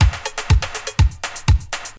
122 bpm 80s breakbeat b key, Free drum loop - kick tuned to the B note. Loudest frequency: 1849Hz; drum loops